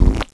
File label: squish; bugsquish